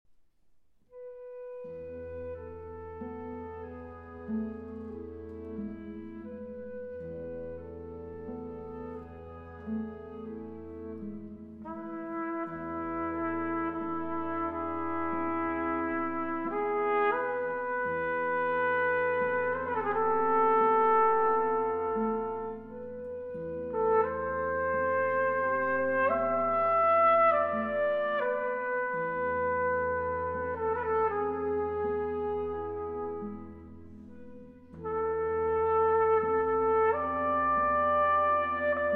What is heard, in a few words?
7 Canciones Populares Españolas No. 5, Nana, For Trumpet and Orchestra; Falla; Arr. Lehn